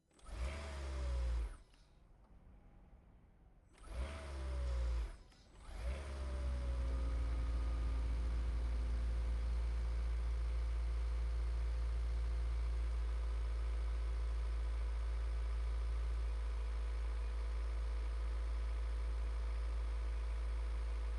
kia niro hybrid onbrd idle petrol steady blips engine; fvtt sonniss gdc audio